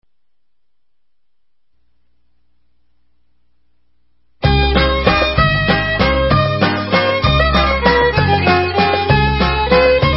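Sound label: Instrumental, Israeli Dances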